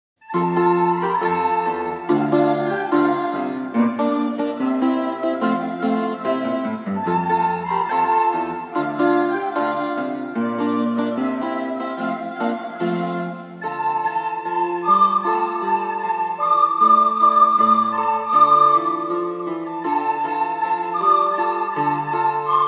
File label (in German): Ragtime, floetenchor